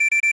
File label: System pipipi high